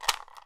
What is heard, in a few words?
SM ELM R, PERC; AKAI S6000 CD-ROM - WorldSounds